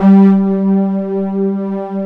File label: SYNTH SOFTS; Pro-Rec - Synthsonic